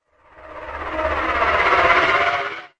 darkness, DRUMS SINGLE HITS, Weird and Interesting Hits